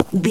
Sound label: footstep concrete run